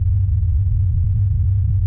Low Rumble; Star Wars Knights Of The Old Republic II; XBOX

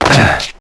land hard; characters; Jump

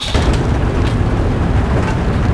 helicopter pad rotate start

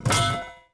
pipe hit metal; objects; Star Wars Obi-Wan; XBOX

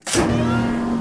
elevator start; Star Wars Obi-Wan; XBOX

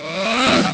skeleton attack; Pirates Of The Caribbean; DUEL

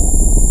Plasma Charging, Plasma Rifle

